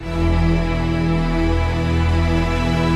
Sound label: e string